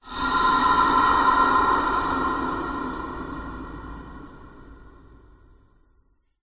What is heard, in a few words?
horror breath large reverb; zapsplat